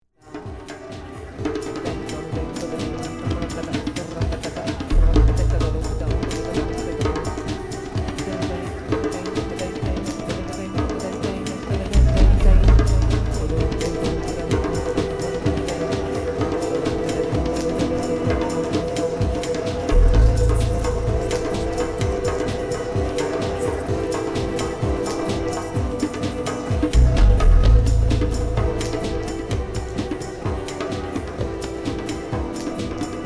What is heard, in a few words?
cello chill sounds